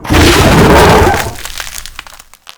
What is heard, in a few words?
Infested Jetpack Charge; Warframe